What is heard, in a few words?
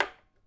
put